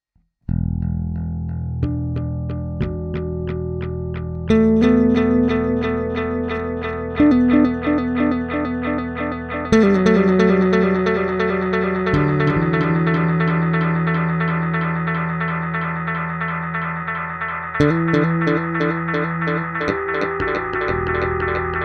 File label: Bass Vintage; ReTracer